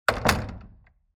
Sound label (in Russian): hlopok zakryvanija